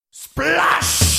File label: splash; gabibbo